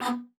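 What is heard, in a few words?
B3, bass flute; Xsample VOL-2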